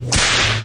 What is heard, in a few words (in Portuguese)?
efeito chicotada; zoeira; no sheriff